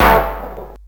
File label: bass